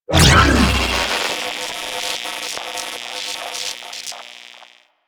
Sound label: HUD Scramble, UI; Warframe